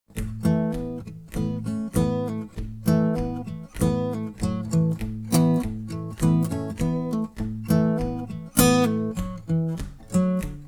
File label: guit solo; guitar